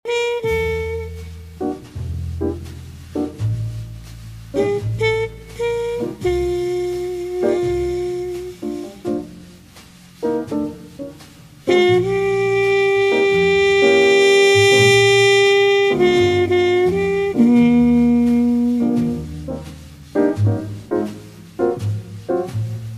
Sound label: melody